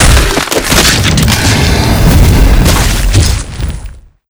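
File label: mazel death, monster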